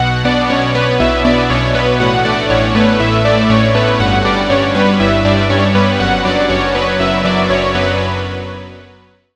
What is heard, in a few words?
Key; Routed Sound; Serum 2 Expansion - Reality Maker Vol.1